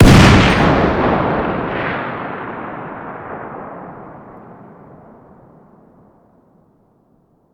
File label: fire dist 50bmg rif ext; gmod; arccw uc commons attachments